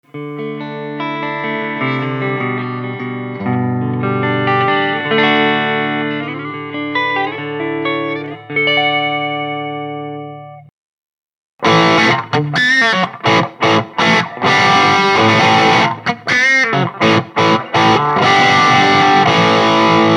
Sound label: peavey